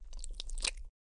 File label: Slide; Lewd SFX